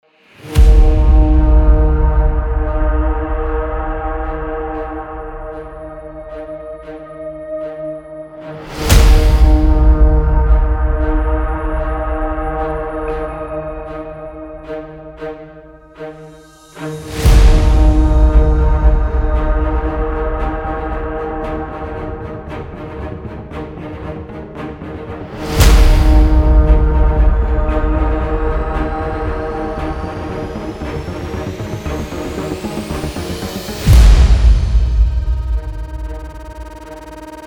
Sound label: Trailer intro